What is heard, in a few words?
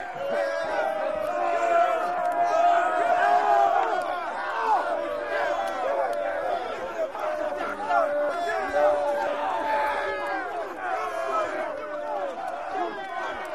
Prison Riot Exterior